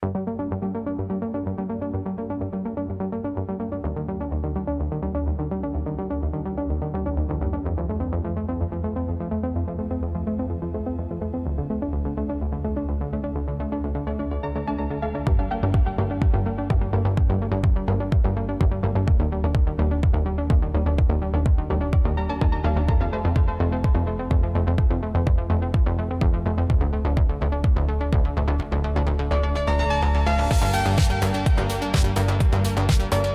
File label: Electronic Impulse